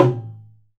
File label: DHOL BEND; AKAI S6000; ETH PERC; WorldSounds